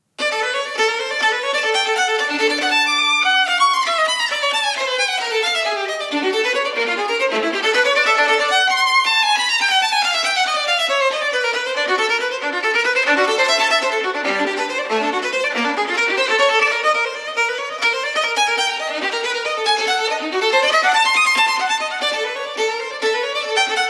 theme with 8 variations for solo violin variation iii allegro assai